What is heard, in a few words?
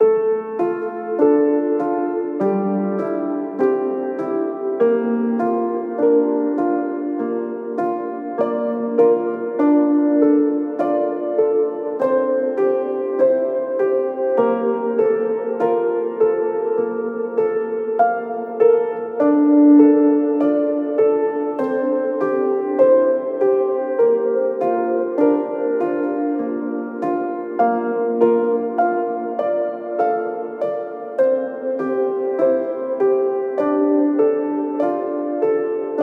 Piano - Dm 100Bpm; Construction Kits; STEMS; Ghosthack; SkyRealm; Kit 26 - Homeward Bound